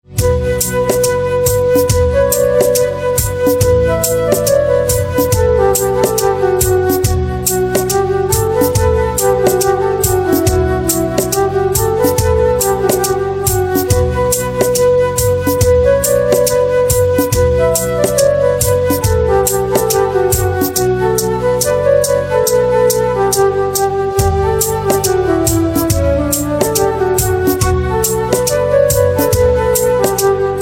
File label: Organ Instrumental Ringtone